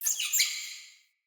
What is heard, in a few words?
Vocal Double Squeak, Animals; Player; Player Floppet